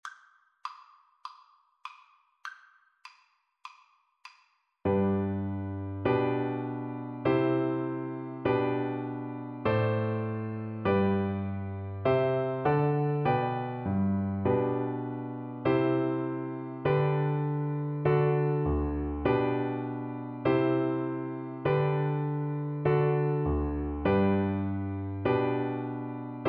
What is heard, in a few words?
twinkle twinkle, oboe